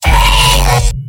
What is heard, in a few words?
pyro m pain sharp; tf2; garrysmod; mvm